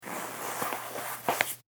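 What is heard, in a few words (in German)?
Gürtel abschnallen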